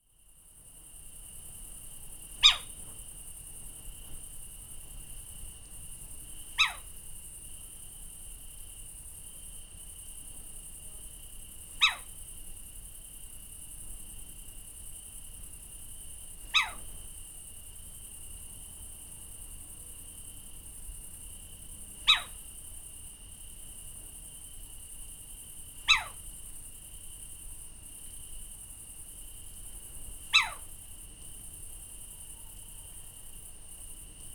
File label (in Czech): Sycek obecny samice